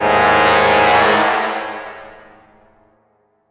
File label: String Medium Brass